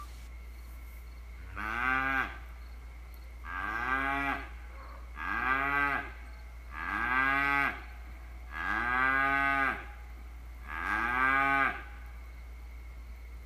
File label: Endless Mooing